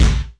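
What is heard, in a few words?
GOGO kick, GO GO DRUMS; Crypton - DrumBase5000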